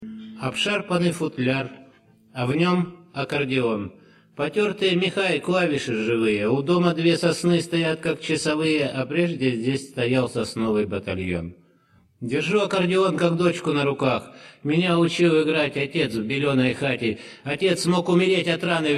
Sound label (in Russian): akkordeon